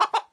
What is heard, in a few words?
Hen Scream; Farm Mania 2